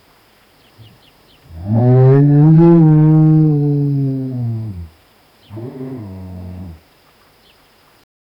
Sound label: lion roaring loudly in the savannah